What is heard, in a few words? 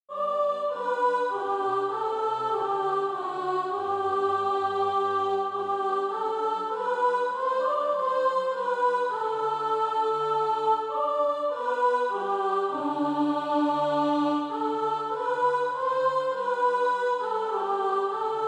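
soprano, SATB Practise Music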